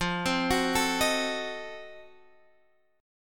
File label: F 7th Flat 9th F Guitar Half Step Down